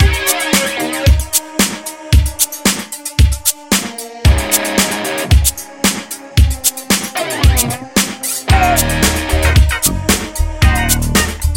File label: LINN LM1 LINNDRUM; VLINN VST